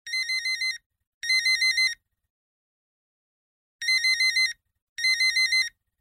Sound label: vivo vintage ringtone